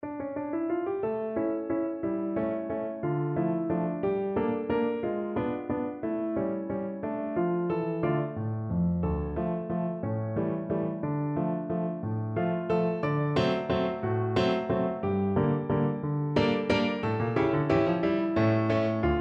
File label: PNO